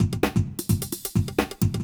electro kit 130

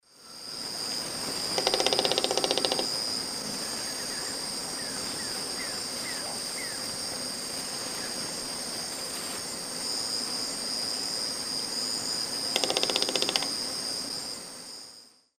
Piculus chrysochloros, drum; S. America; Eastern Lowlands

